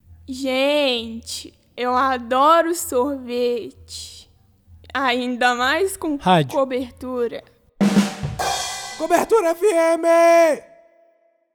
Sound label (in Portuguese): vinheta